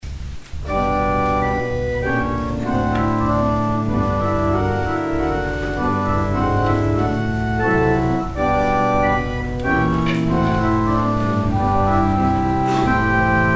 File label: organ interlude